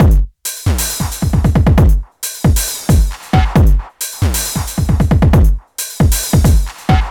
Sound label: DRM, LOOPS 135, House; Best Service ProSamples vol.10 - House; AKAI